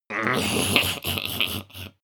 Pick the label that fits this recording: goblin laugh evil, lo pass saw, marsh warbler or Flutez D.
goblin laugh evil